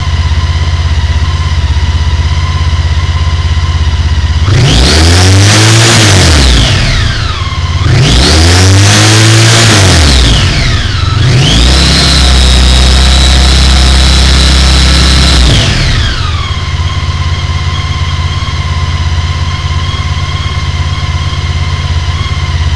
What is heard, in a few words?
ST1300 OEM Exhaust; Exhaust Sound Clips